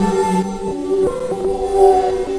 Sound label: Nautical Choir, Vocal; Sonic Foundry (Sony Creative Software); Myths of Technology